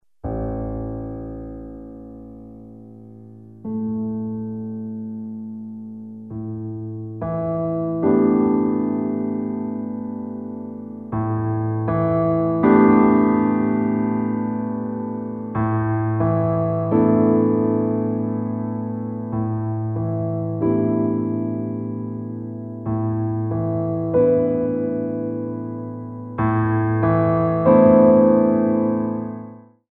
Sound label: piano